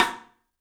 MARFSN, 3D Drums, X STICK; 3D Sound

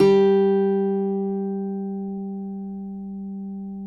CUATRO G 3, Guitars; Spectrasonics; Hans Zimmer Guitars VOL-2

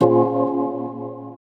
D&B Keys; neuro

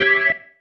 Stab, Organ, One Shots

